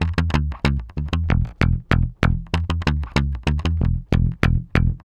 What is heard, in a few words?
Bass E Funk